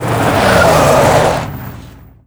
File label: jeep skid stop; Call of Duty Modern Warfare